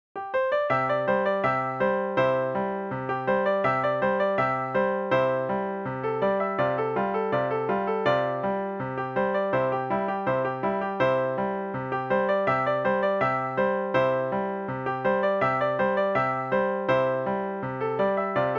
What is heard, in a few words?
eighteen twelve overture for piano solo